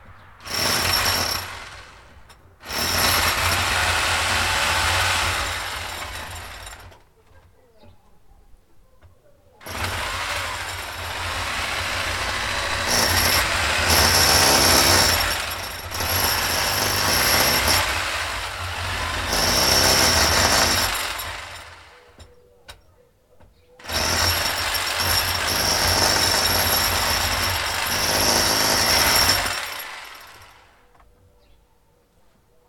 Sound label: power drill drilling in concrete outdoor, Recorded outdoor in open field